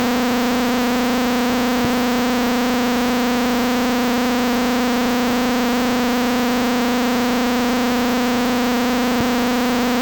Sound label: smps no load